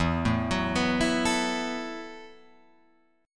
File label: E Suspended 2nd Suspended 4th E, Guitar, Open F; arpeggiated; JGuitar